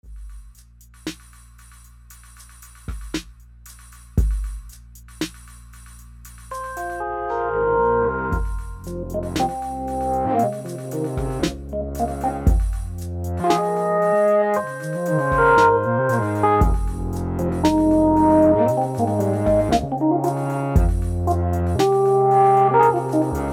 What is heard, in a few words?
Matriarch bass / SV1 organ > Matriarch / Zoom R8 on drums